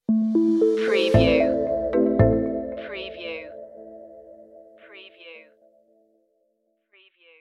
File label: diminishing returns stinger